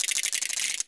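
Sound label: ratchet backward, percussion; ILIO; Synclavier Percussion 2; World & Orchestral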